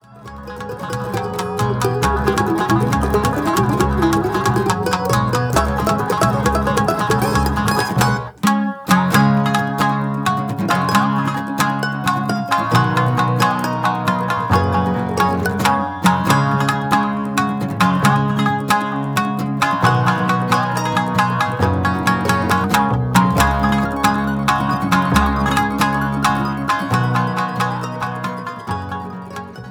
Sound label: resonance sample